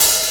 ohh

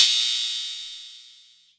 Metal

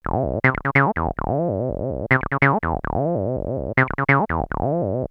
303 Loops; X-Static Goldmine

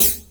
CHH, Drum & Bass, 165 BPM; Ueberschall; Drum & Bass Resonance

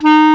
clarinet D#3; InVision Interactive; Lightware VOL-1; Instruments & Percussions

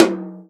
tom, Real Drum Kit, jungle kit

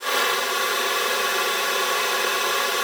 alto sax D#4; Xsample VOL-2

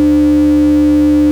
BUCHL, Rare Synthesizer; Hollywood Edge; Giorgio Moroder Rare Synthesizer Collection

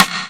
RIMSHOT, Ragga & Dub Reggae; USB Soundscan vol.27; AKAI

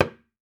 D, talking drum; Best Service ProSamples vol.55 - Retro Sampler; AKAI

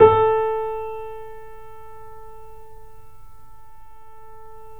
A, The Piano; InVision Interactive

